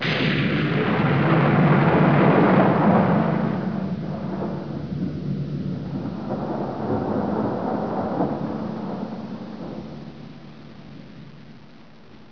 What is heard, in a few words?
thunder; The Tempest